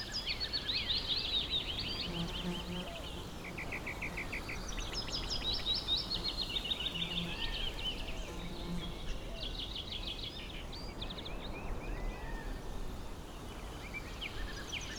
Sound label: summer morning ambience loop